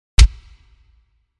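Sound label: Kick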